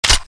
m2 clip in

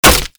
tactical wood

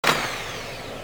drill draw, weapons; sgdrill

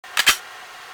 shoot start, weapons; blockar2

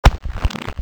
zombi stamper glove; cso

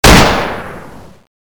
mauser96

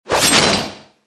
player zombie normal female attack

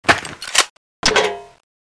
water cannon clip out

